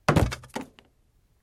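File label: sounds where a person stumbles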